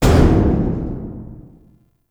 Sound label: Weapon One Hull Mech B; Warframe; RailJack Weapons; Modular Weapons